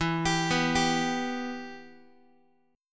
E-Minor, Guitar; arpeggiated; JGuitar

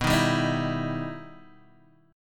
E Minor Major 7th

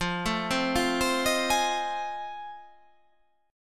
F 7th Sharp 9th

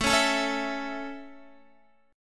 A Major, Guitar; strummed; JGuitar

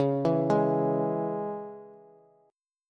C sharp Minor C sharp Bass, chord; arpeggiated; JGuitar

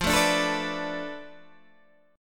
F 6th Flat 5th F down Guitar DADGAD, chord

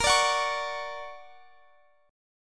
D Augmented Major 7th A sharp, guitar

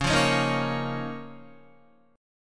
C sharp 6th Add 9th C sharp Guitar; strummed

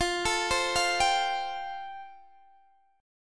F Major Add 9th Guitar DADGAD

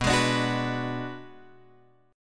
B Minor 6th B, Guitar Open F; Bm6 strummed; JGuitar

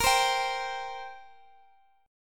G Minor Double Flat 5th A sharp

